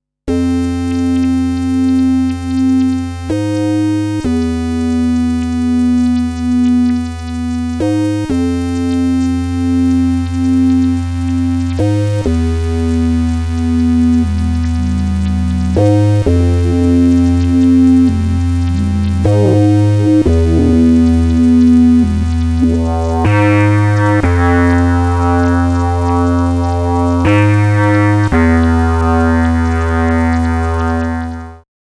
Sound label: feedback bass + sh bpf